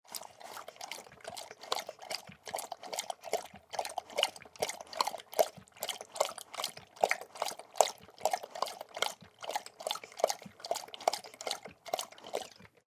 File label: dog drink water